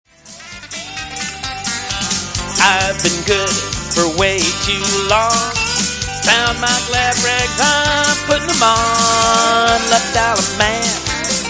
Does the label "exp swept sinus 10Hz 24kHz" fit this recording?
No